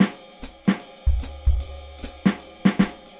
Jazz Drum Beat

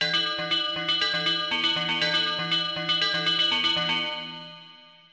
Metallophone

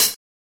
HiHat

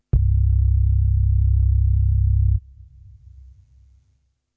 Fat Bass Lick

